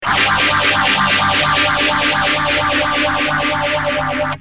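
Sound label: guitar, Yamaha phaser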